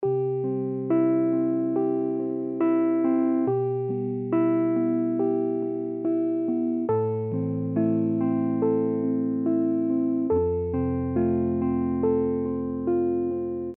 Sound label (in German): eine E-Piano-Begleitung; Original; Native Instruments Molekular